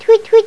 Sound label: tweet; Old MacDonald